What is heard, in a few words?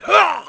Block Dodge; Ganondorf; Zelda